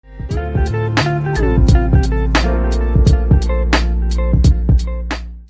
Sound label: chill music